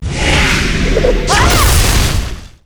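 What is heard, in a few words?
attack, monster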